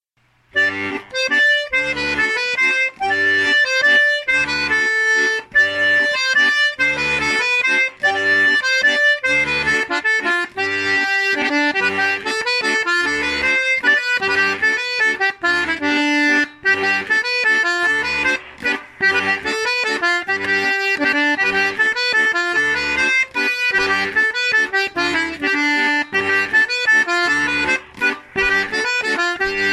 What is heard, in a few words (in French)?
Bourrée à Chabot